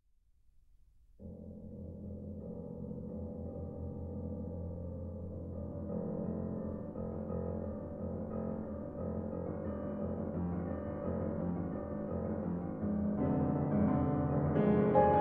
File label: La Valse For Solo Piano